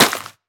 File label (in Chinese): 沾泥的红树根踩踏声